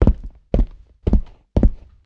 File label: wooden floor loop, footstep; RYK-Sounds